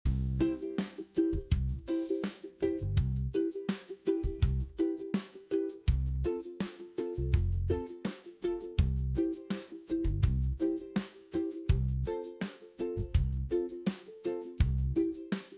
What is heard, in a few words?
BGM; Bahamas